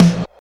hot snare f key, snare tuned to the F note. Loudest frequency: 567Hz; snare drum samples; single hits one shots